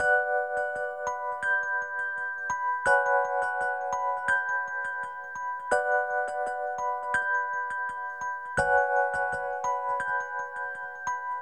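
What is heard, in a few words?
piano, B minor, Hip Hop & RnB; West Coast Rap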